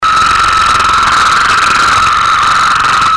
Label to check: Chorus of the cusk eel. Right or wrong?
Right